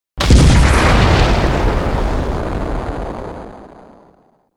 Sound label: Huge Explosion